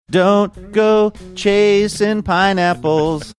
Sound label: singing dont go chasing pineapples